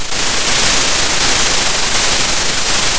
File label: Sound of a thunderstorm